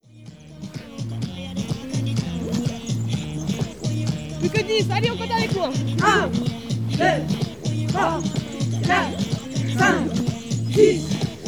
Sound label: jingle; radiorageeuses